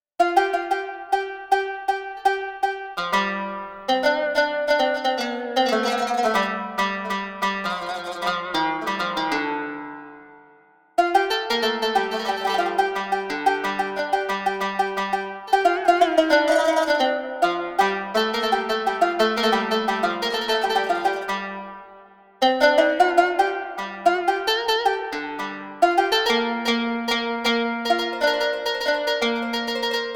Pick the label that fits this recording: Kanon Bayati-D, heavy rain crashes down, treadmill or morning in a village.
Kanon Bayati-D